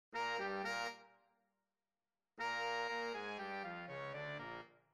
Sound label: horn snip